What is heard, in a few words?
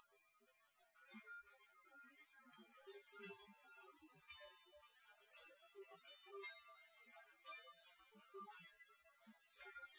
nodrums liverec 250ms 5cm; SiSEC08; SiSEC underdetermined